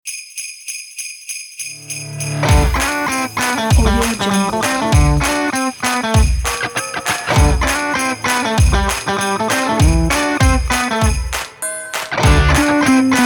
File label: christmas rock